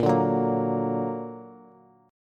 Bb Augmented Bb, Bass; strummed; JGuitar

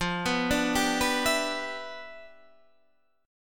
G 6th F; G6/F arpeggiated

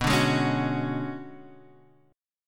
B Minor 6th Add 9th B down Guitar Half Step Down; Bm6add9

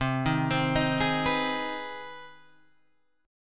C Minor Major 7th Sharp 5th, Guitar, Open G

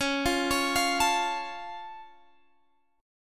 Db Augmented, down; arpeggiated

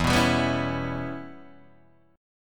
E Minor 6th guitar chord, Open G

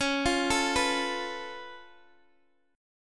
C sharp Augmented 7th, C sharp, Guitar, Open G; down; chord shape

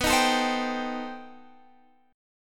C Minor 6th B; Cm6/B

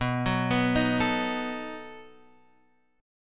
B 7th, B7 chord, Guitar Standard; B7 arpeggiated; x,2,4,4,4,5; JGuitar

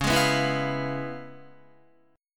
Db 7th Sharp 9th Db, down, Guitar; Db7#9 strummed; JGuitar

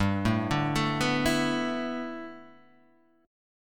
A 7th Suspended 2nd Suspended 4th

